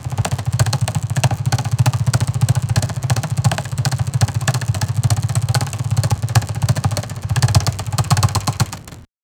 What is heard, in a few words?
rapid pedal sewing machin; sound effect